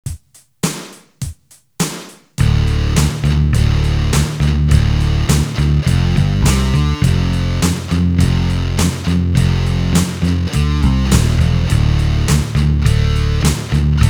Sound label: riff, guitar